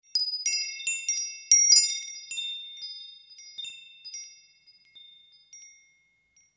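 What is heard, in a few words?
chain sounds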